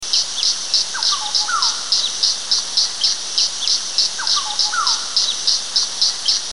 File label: Cicada, India